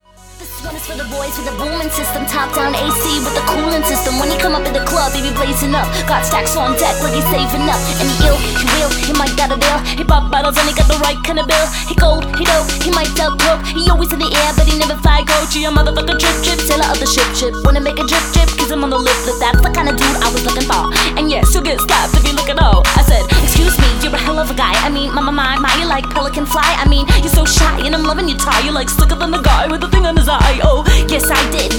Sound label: Super Bass